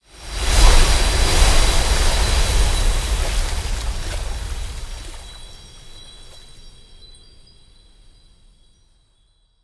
Water; Legend of Keepers

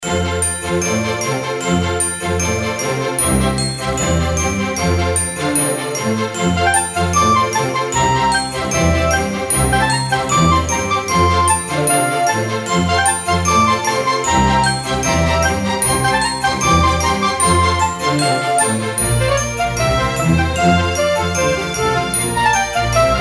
bgm minigame snowman

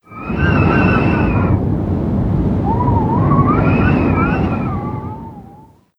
Whistling Wind

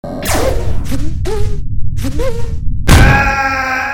Lightspeed

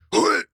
Ruins Sentry Fat Startle

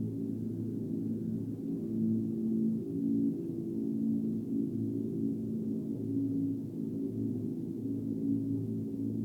Train Hum Loop

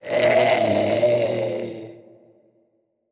Mummy Death

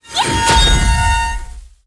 Scholar Sage F attack

